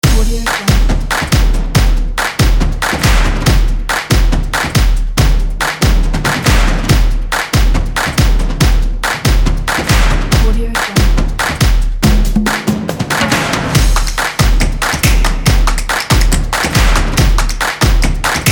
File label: this is drums